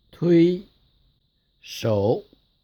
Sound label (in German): tuishou